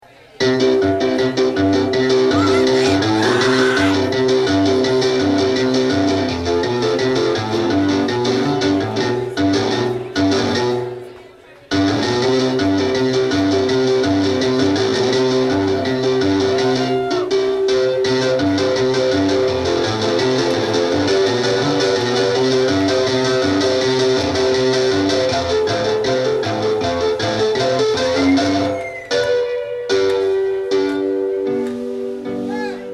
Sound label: instrumental, banter; live 2001